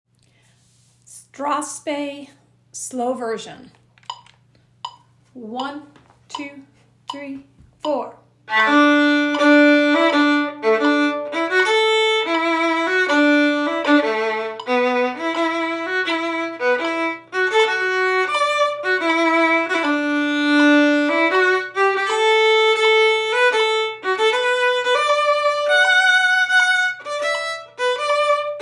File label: Strathspey slow version